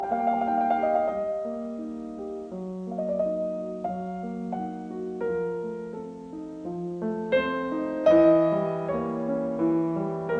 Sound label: Piano Sonata No 4 in E flat major I Adagio; Lili Kraus